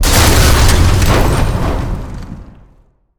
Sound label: Debris